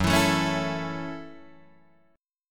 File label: F sharp Minor 7th F sharp, Guitar Open D